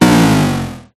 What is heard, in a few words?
multimedia game negative lose life tone